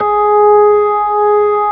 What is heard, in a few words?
ORG B3, B-3 Organs; Sound & Vision; Gigapack I CD 2